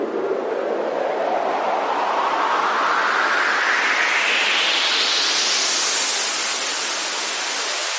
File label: Beach Day Noise Build 120 C